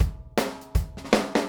160 Country Shuffle Drums; Pop Rock

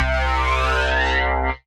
Bass; Ableton; Build and Drop

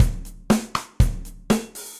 MPE Kit Direct Natural, Session Drums; Ableton; Session Drums Studio

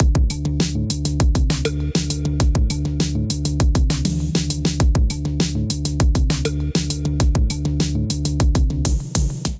Give & Take Kit Melodic 100 bpm, Drums; MIDI Clips; Drive and Glow; Ableton; Factory Packs

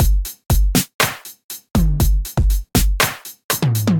Kit, Drum Kits Standard; Apollo Kit; Ableton; Factory Packs; Drum Essentials

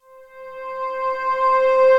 Barely There Pad, Ethereal Pads; Instruments; Ableton; Mood Reel

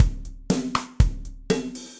Kit Multirod Dry, Drums; MPE Kits; Ableton; Session Drums Studio

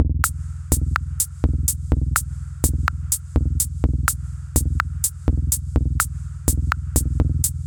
D55 Intro, Drum Machines; Samurai; Ableton

